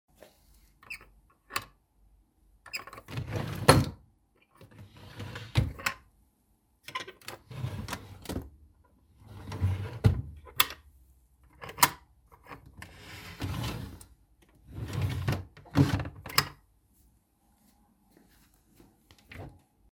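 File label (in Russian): small chest of drawers with bronze handles, hinges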